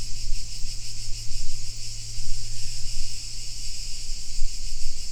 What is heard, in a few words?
Atmo Crickets